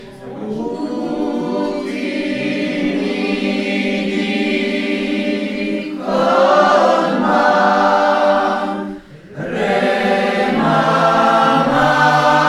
ensemble, Chansons; Maremma; St Jean

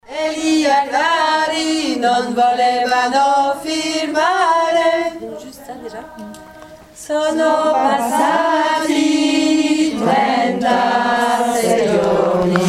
e partita alti

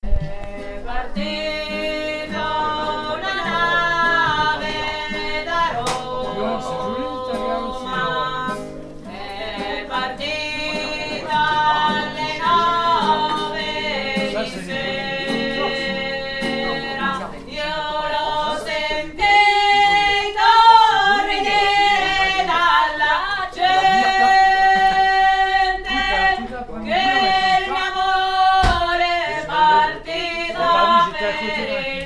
E partito altos; Ganges

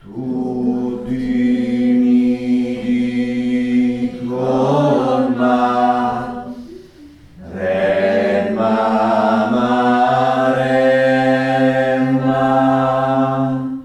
Maremma contralti; St Jean

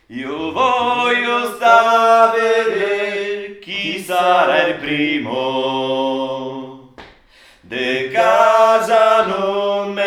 TENOR2; Liberte; St Jean

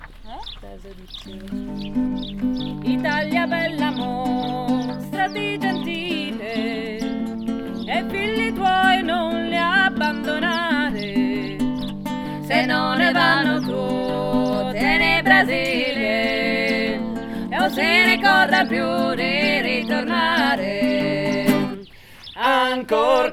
TRIO, Chansons; Pellafol

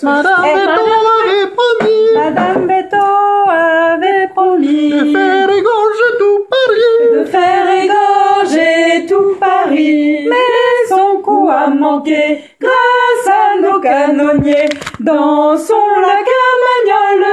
ALTO; Carmagnole

